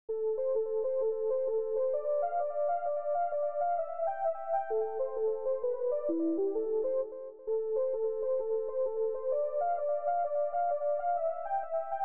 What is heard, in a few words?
beatdings